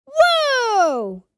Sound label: Excited vocalization boy kid child